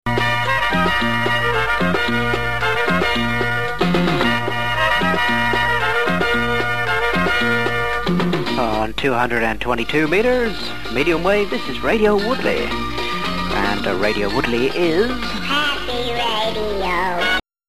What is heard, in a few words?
happy radio